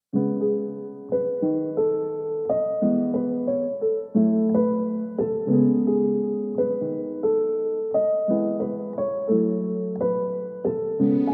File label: Piano Underscore; Anger Music Library